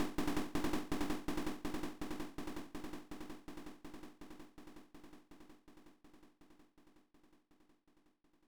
Rock Fall Danger; Pooyan; Arcade

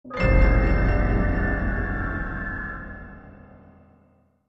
SHOCK; F.E.A.R. Perseus Mandate

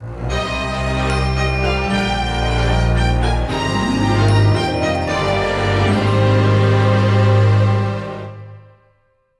Jingle The Curtain Rises; the great ace attorney chronicles

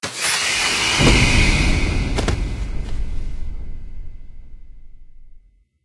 Caged Creature Death; silent hill homecoming; ps3

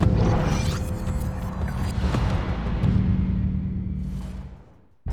Splash

